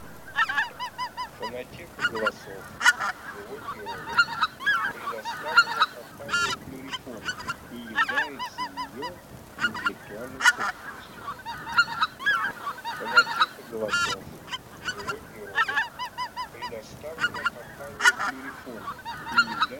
Белолобый базар Oche Lombardelle

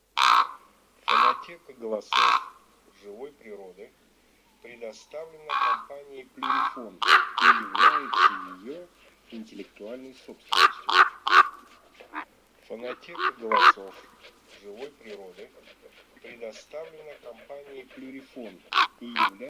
Ворон Corvo imperiale

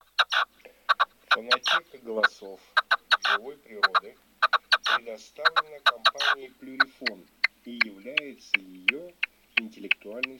Куропатка красная Pernice Rossa; plurifon

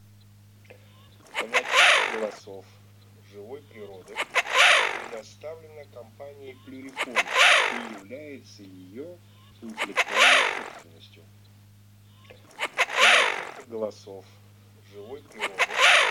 Свиязь Fischione; plurifon

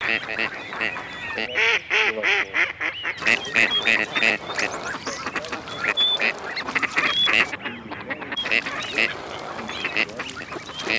Серая утка Canapiglia; plurifon

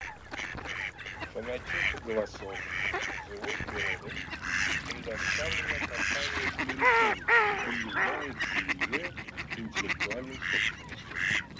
Кряква весна Germano Primavera; plurifon

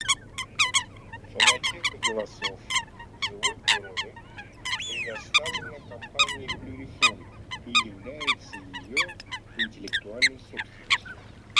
Лысуха Folaga; plurifon

